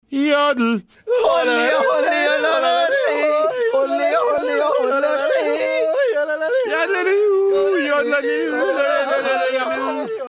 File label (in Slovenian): Jodlanje